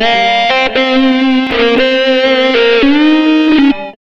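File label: extra lead; out vamp; Sonic Foundry (Sony Creative Software); Ugly Remnants VOL-2; Leads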